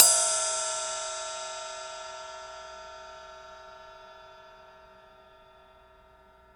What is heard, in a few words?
Ride